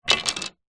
wood pine plank short thin 40mm x 10mm set down wood decking; foley